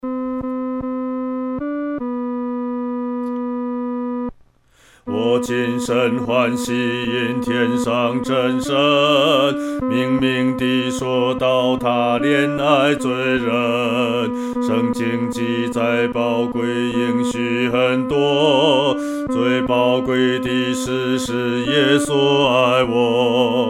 独唱 第二声

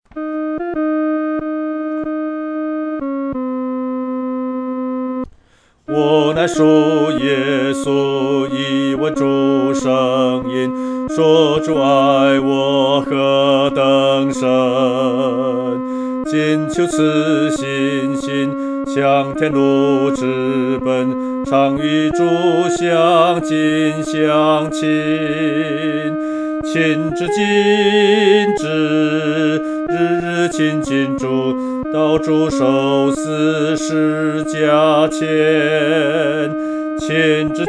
独唱 第二声

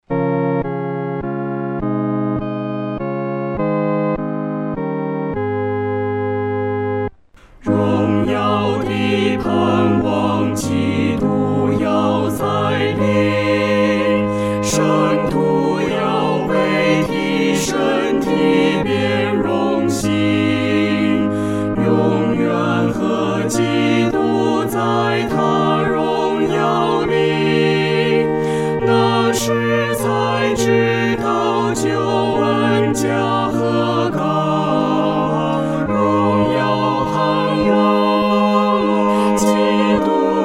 合唱 四声部

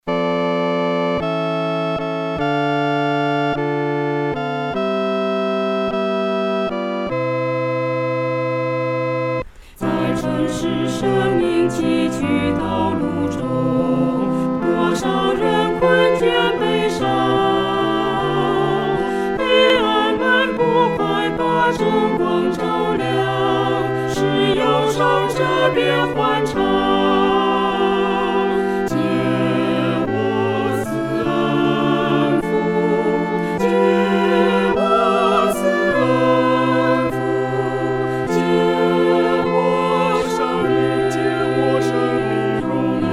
合唱（四声部）

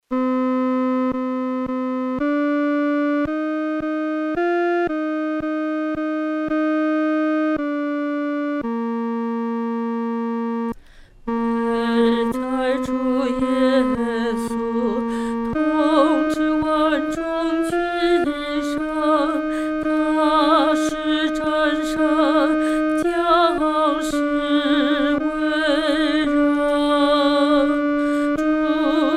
独唱（第二声）

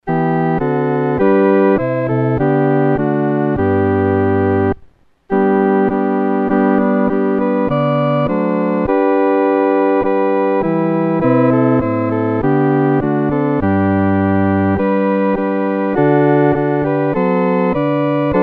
钢琴合奏（四声部）

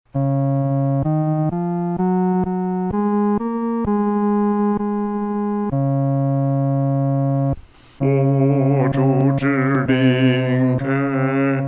独唱

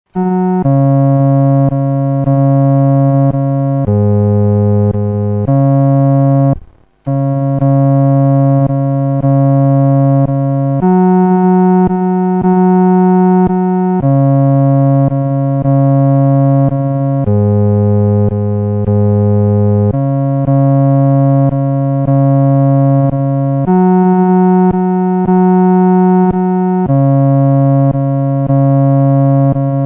独奏 第四声; Sweet Hour of Prayer; William W. Walford, 1772 – 1850

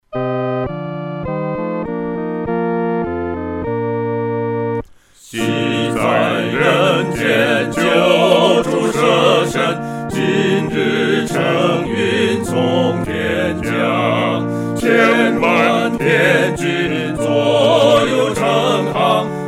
合唱 四声部

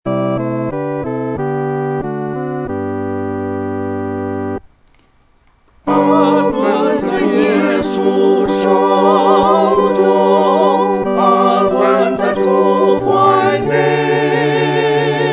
合唱 四声部; 安居主怀

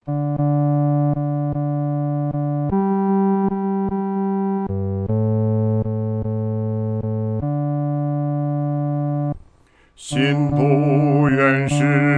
独唱 第四声; 信徒原是神的工作

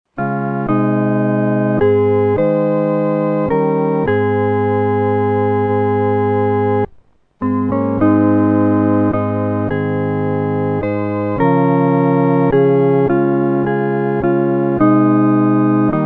合奏（四声部）; 岂能让主独背十架

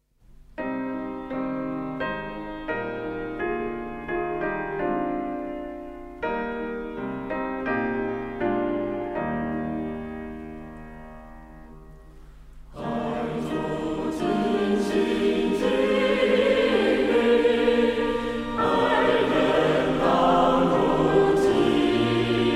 邻舍就在身旁歌

